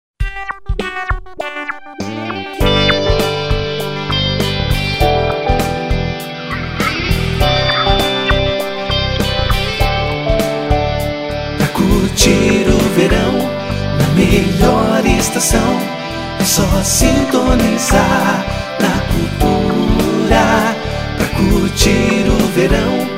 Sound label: jingle